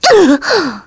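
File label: Vox Damage, Korean; Maria; kingsraid; voices heroes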